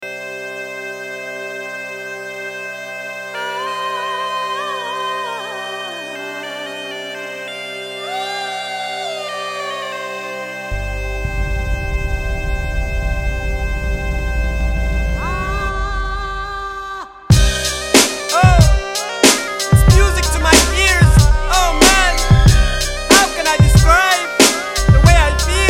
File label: Bagpipes